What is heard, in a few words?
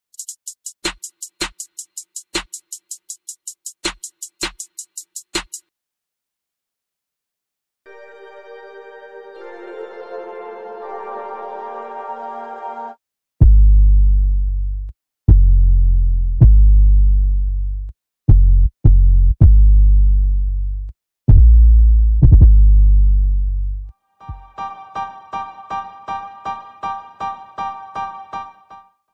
nepali song ringtone